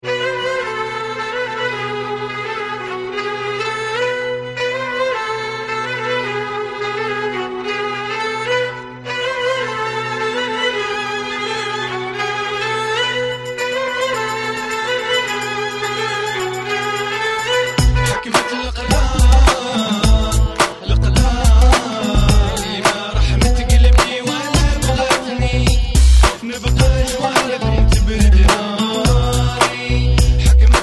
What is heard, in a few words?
Telephone Arab